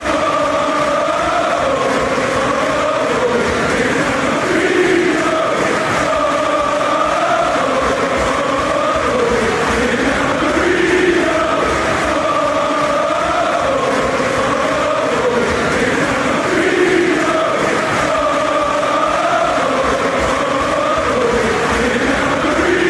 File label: player chant; mikel merino arsenal; united kingdom